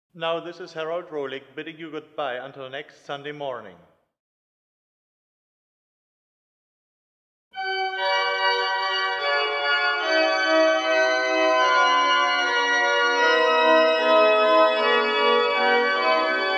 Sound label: Improvisation